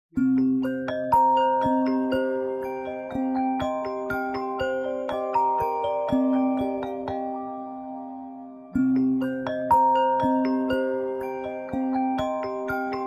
iPhone 13 Pro Max Lenta

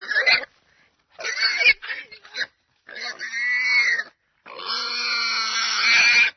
Sonidos De Jabali

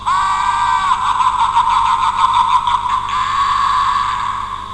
risa; freddy krueger

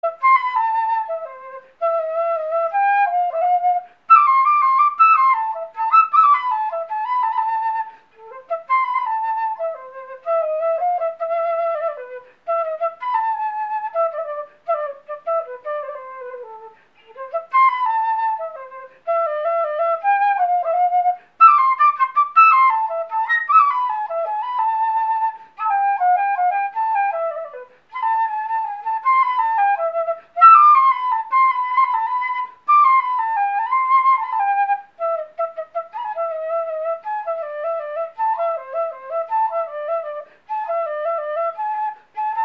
flauta de madera

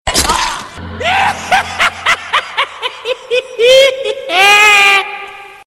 risa; chucky mata

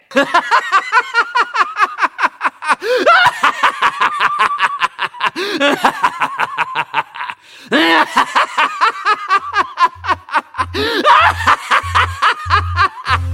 risa jajaja; vegeta

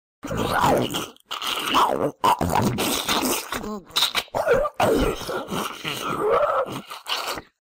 apocalipsis zombie

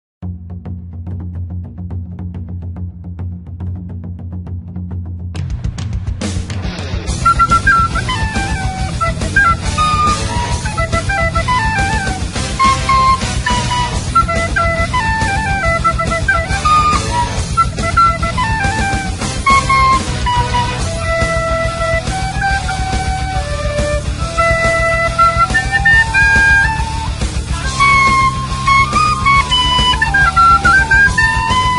flauta; naruto

